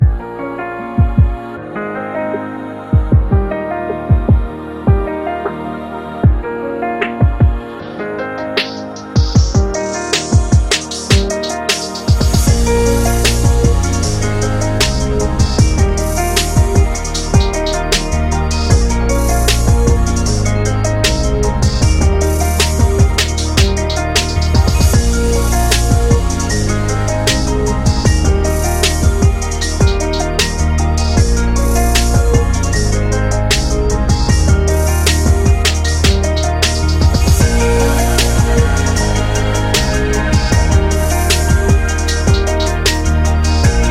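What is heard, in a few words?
Fashion Lounge, Corporate background music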